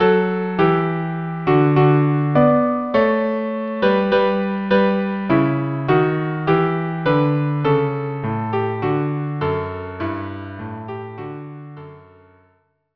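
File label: violin cello duo; Peasant Song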